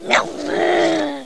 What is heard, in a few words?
growl; starwars; ewok